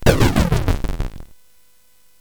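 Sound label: Nebulus, MOS 8580 SID chip music, games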